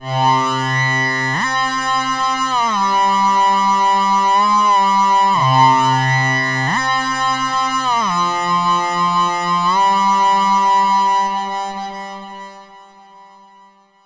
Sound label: mono lead clap, synth